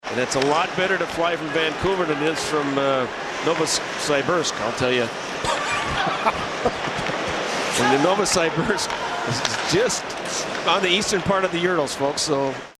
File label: urinal